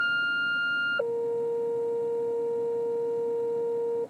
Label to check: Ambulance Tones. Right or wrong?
Right